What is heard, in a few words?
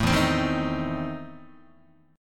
E Major 7th Suspended 4th Sharp 5th, Guitar